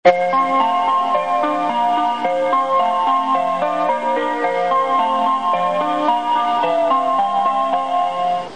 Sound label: bus chime; 富士急バス; チャイム２は中央高速バスの新宿発着で流れます。